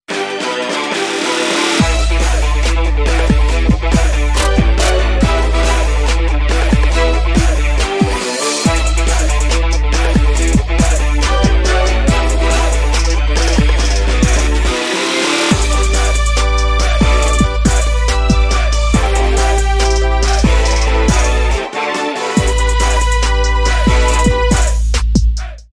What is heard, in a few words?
trap remix ringtone; Magnum PI